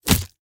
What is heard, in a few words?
Fight Attack Bow Damage; Mighty Party; Android